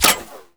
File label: impact helmet ric; Escape from Tarkov